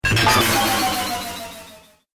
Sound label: Droid Power Down, Robot Sound Effects